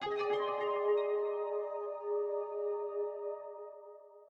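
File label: Menu Start, game music; Proteus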